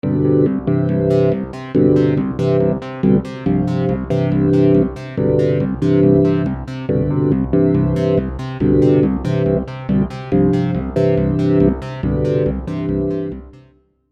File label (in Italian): pedale